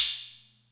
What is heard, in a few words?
Atlantex Music Percussion Computer HH closed, drum machine